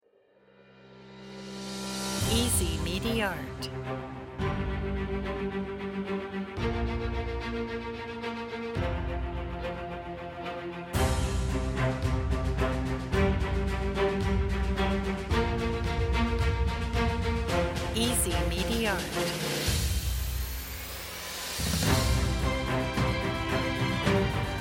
epic trailer

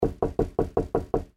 knock on the door

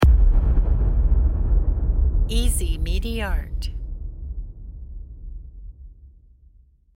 fantasy bang

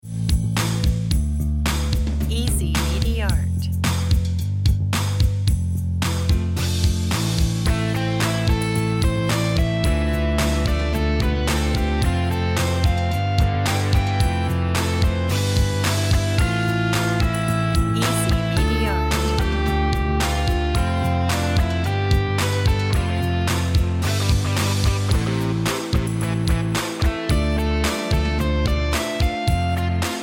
guitar intro

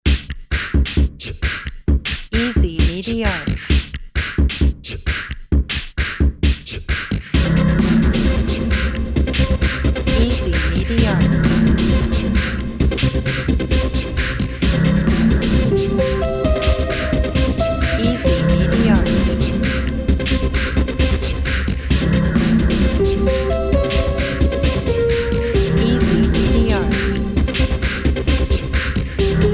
turkish

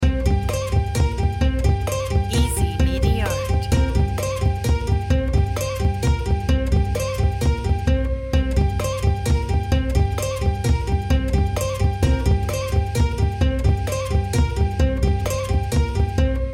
ethnic feelings

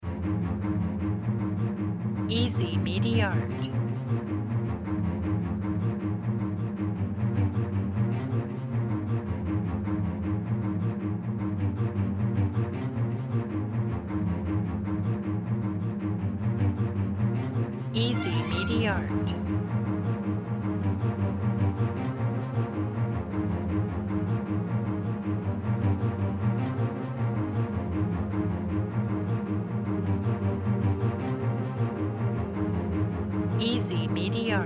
symphonic run cello

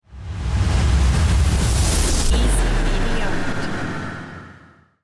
giant swoosh

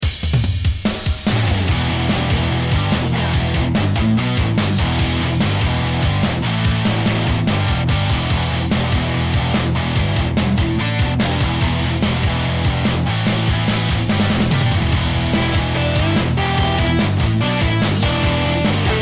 bouncy rock

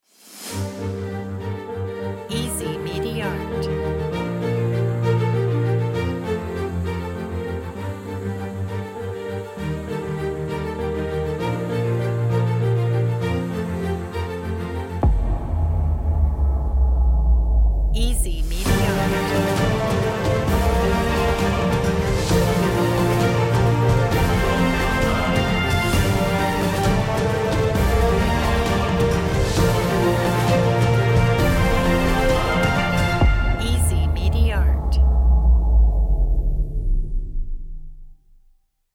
epic trailer

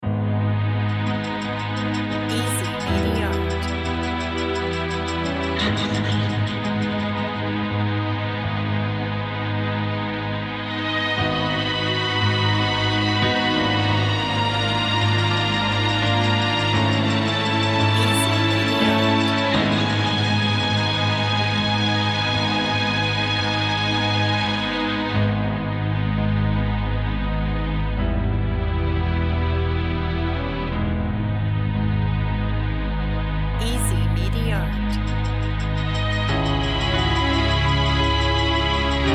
suspens

